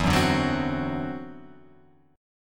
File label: E 7th Suspended 2nd Sharp 5th, E, down, Guitar, Open F; E7sus2#5; strummed